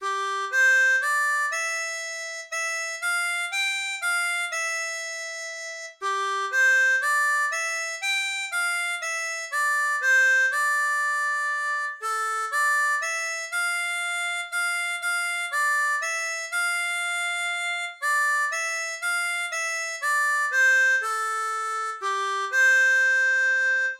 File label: Air, harmonica